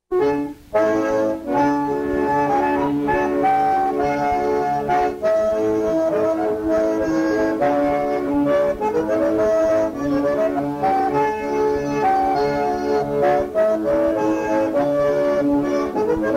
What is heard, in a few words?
Jailbird Polka, concertina